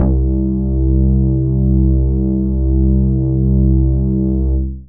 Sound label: Orange Bass; Big Fish Audio; Old Skool House; OSH Bass